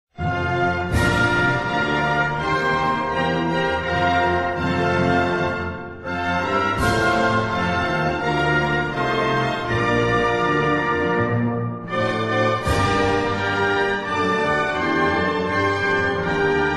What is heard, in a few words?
anthem